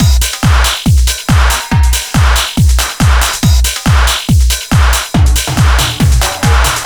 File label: Technik Beat A, techno trance; Сэмплы ударных (Техно-транс); 140BPM; Drums; loop